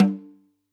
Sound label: K1 close Snr Off